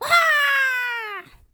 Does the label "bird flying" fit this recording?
Yes